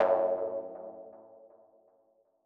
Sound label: Mono DBeat F#2; KG MegaSaur